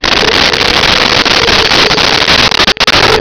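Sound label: ambience crane winch loop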